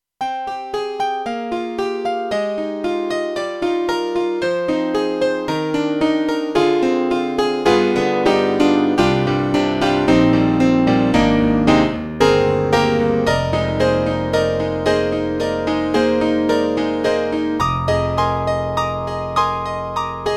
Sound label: YAMAHA MU5 E.Grand